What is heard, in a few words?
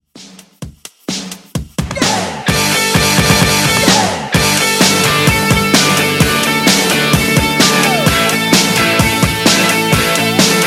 80s Rock Re Drum 129 Bpm